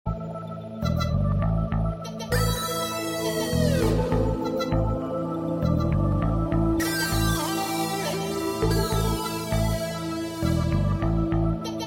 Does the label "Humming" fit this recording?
Yes